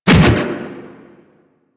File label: Fist Whoosh; DE; Warframe; Weapons; Archwing; Melee; Grn Arch Regor Fists